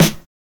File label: acoustic snare sound E key, snare drum tuned to the E note